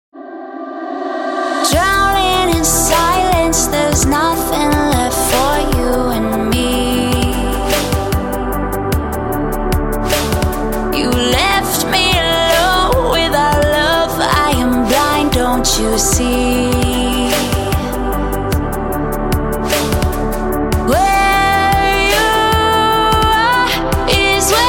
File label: vocal